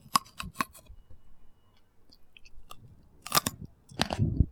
eating a white grape crackling

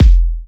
tight lows bass drum F key; drum single hits one shots; kick drum samples

